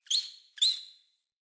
Rat Trapped Call; Ambient; Vocals; Verminia Messenger Rat; Animals

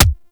Weapon Punch Shotgun; Weapons; Shotguns; Warframe

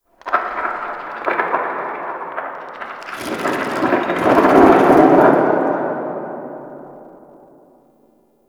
Rock Fall Cliff Distant; Ambience; Warframe

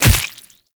Splitter Gore Spew Burst; Warframe; Infested; Grey Strain

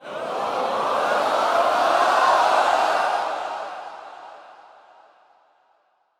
Crowd Excitement Start Up; Lunaro

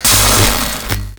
Void Dragon Ring Sparks; Enemies; Gameplay; Warframe; Duviri

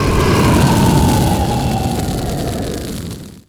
Hazard Impact; Ember Prime Boss; Warframes

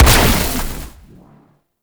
Amplifier Chassis Fire; Weapons; Operators Amp Chassis Part A Fire A; Warframe; Amplifiers

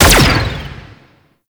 Hazard Laser Beam Start; Gameplay; Stadium; Warframe; Nineteen Ninety Nine

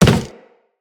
Walk Wood, Footsteps; Operator

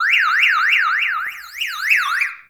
Car Alarm Destroy; Warframe; Ambience; NineteenNinetyNine

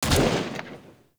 Fire Shot, Weapons; Warframe; Braton

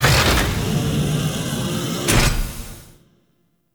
Door Open; Cinematics; Midnight Oil; Warframe; Tau

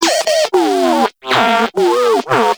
Moa Compassionate Vocal Disagree; Pets; Warframe

